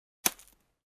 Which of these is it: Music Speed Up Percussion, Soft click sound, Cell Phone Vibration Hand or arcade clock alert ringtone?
Soft click sound